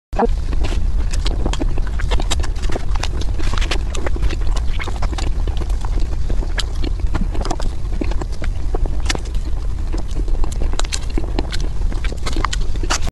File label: Eating cream filled cake